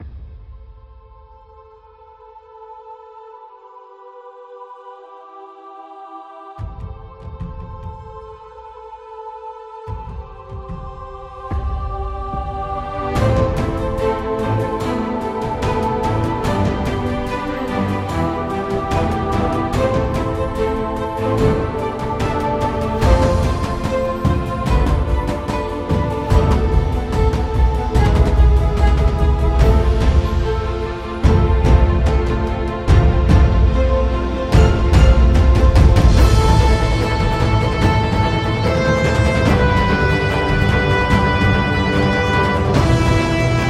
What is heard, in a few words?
Epic Inspiring, epic music; epic music vol 1